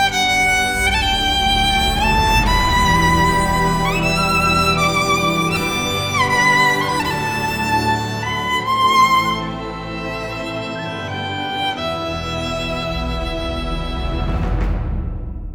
epic cinematic

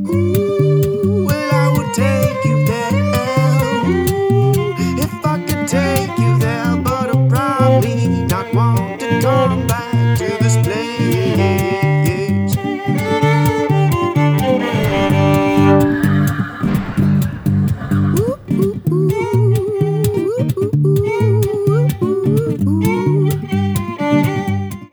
folk

